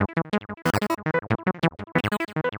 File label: Heavy Industry Syn Round, Keyboards